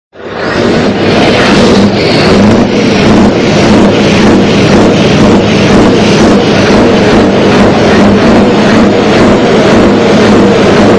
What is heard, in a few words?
scania v8 r620